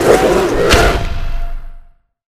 critters jumpscare; nightmare criters

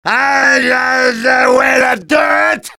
battle cry; demoman

